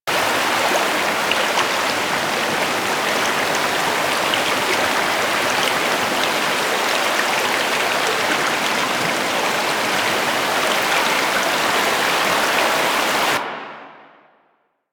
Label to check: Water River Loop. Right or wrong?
Right